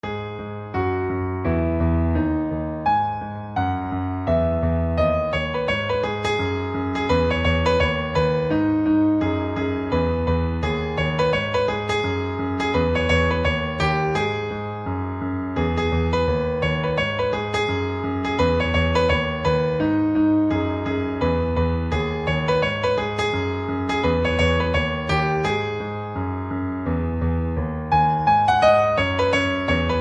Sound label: Piano Cover